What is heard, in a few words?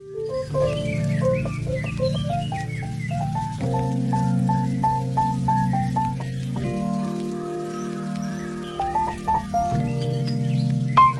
Best Calm Ringtone